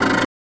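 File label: Apple Iie Disk Chatte, computers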